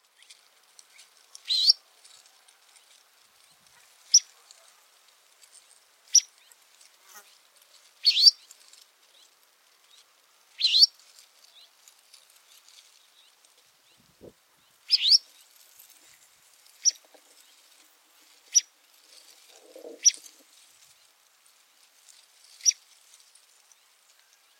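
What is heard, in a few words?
ploskonosyy plavunchik golos, red phalarope voice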